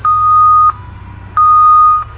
truck reversing

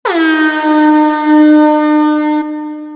air horn

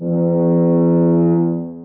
fog horn

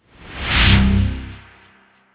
whoosh

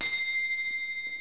chimes

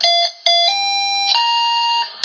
Hatzulah tone, radio effect

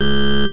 nasty sound

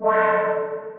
unexpected warning

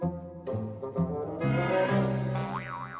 towewwww

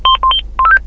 Radio power on

